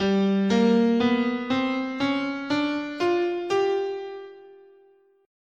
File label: G Mixo Blues, scale